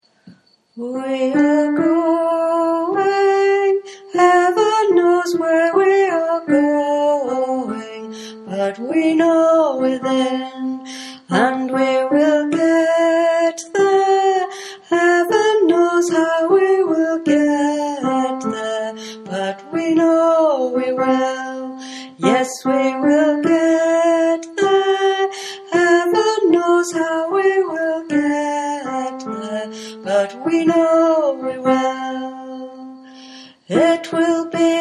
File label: Bass